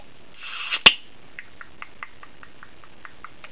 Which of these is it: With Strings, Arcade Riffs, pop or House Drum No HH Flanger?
pop